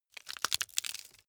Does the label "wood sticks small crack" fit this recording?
Yes